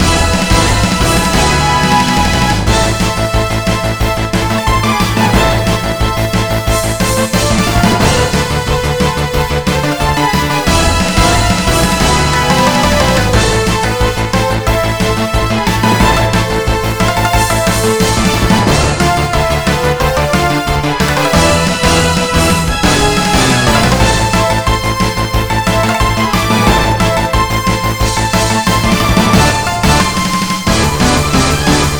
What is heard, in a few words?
Boss fight; Ninja Prophecy